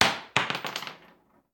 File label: Bullet Shell; Sound Ideas; Guns